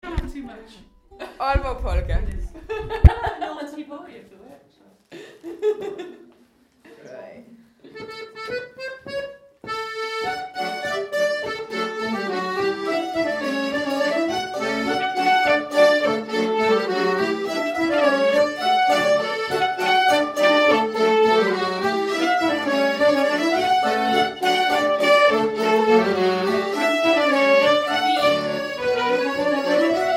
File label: Polka, Danish Tunes; Aalborg Polka